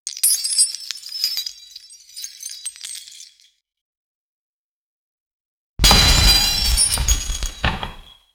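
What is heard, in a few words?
treasure chest opening; legend of zelda esque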